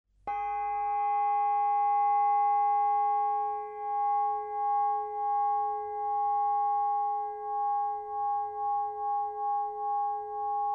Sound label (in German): klangpyramide